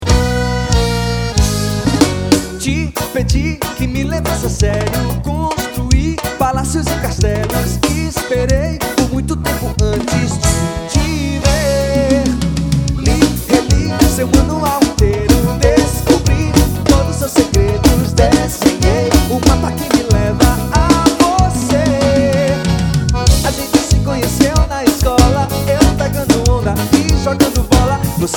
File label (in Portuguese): carnaval; carnaval 2013